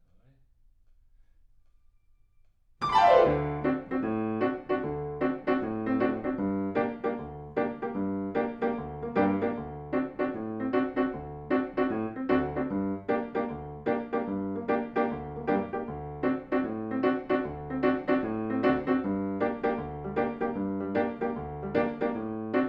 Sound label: PIANO